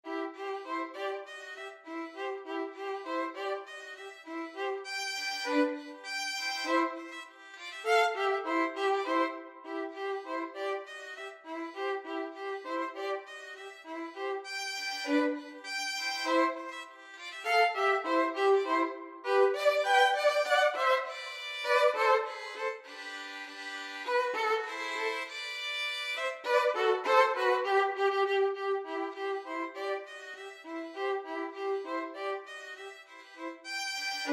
Birds Galopade 2 violin

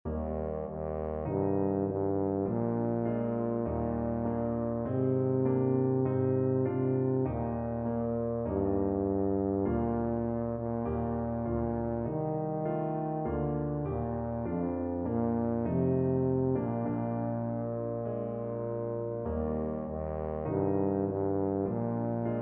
holy holy holy, tuba

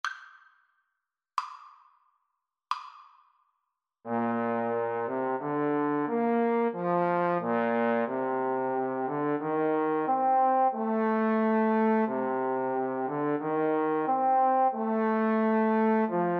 jodler, Trombone Duet

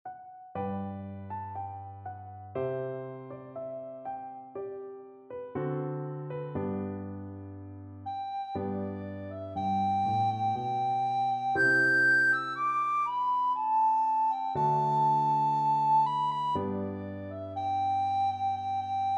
recorder

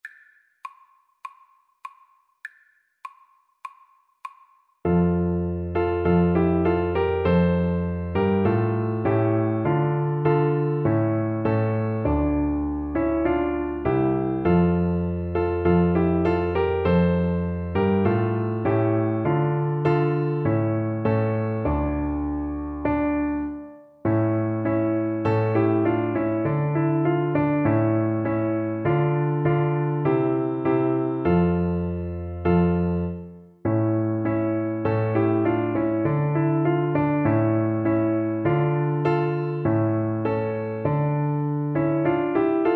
ukraine national, Trumpet

